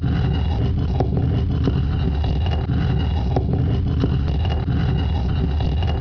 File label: door move